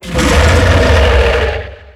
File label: beast vocal grumble